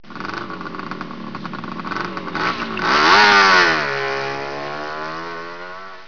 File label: dirt bike rev